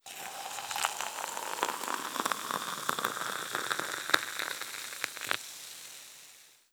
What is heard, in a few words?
Pouring Boiling Water Into Instant Coffee